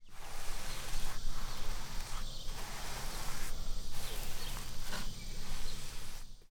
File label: Paint Brush